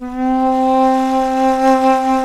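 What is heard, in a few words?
Japanese FLUTE C6; Soundscan vol.60; Asian Traditions; AKAI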